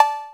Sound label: COWBELL, one shot drums; App Inventor Media